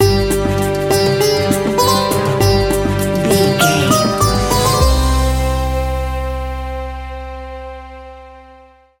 indian soundscape background music stinger, Indian Soundscape Background Music. An exotic and colorful piece of World music. Groovy, powerfu